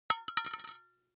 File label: glass metal small drop